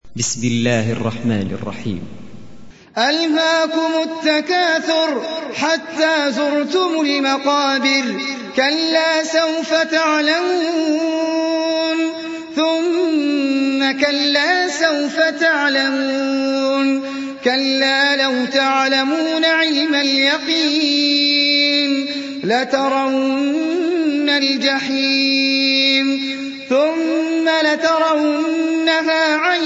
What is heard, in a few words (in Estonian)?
At Takathur retsitatsioon, koraan